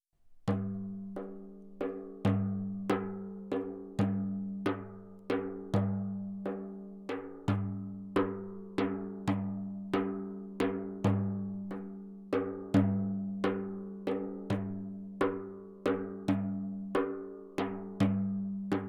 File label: bendir anechoic dum tek tek, percussion